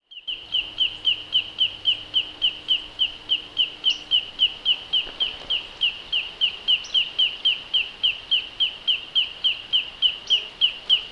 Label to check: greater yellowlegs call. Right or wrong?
Right